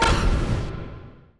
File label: UI Counter; Monster Jam Steel Titans